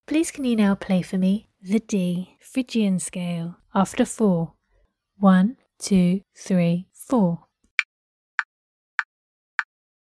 d phrygian scale

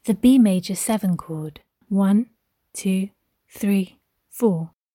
b maj7 chord

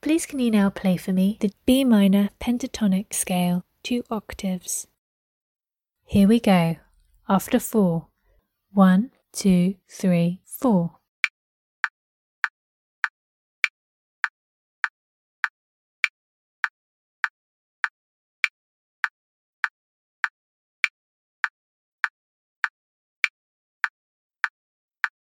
b minor pent 2 oct scale

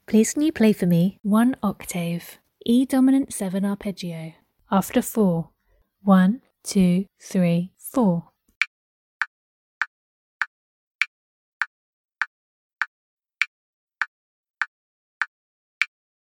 e dom 7 arp

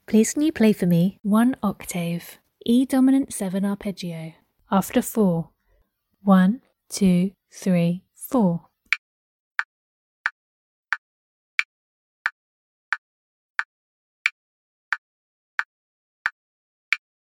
e dom7 arp

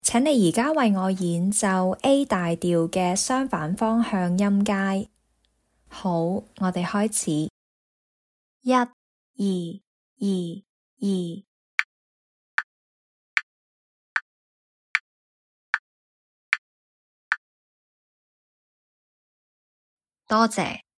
a maj cont mot scale

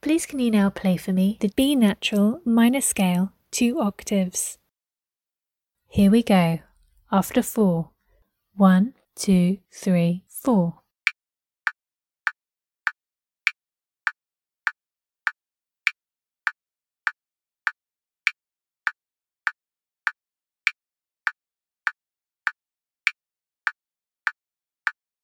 b nat minor 2 oct scale

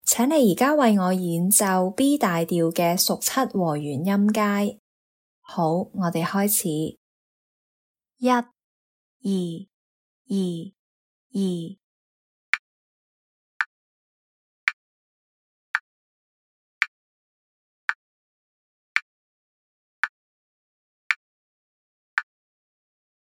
dom 7th in the key of B

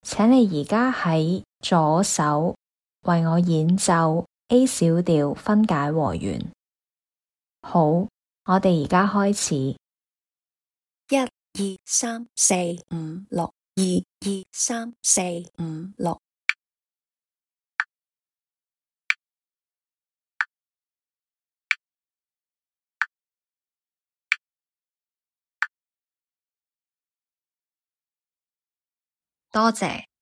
a min bro ch lh

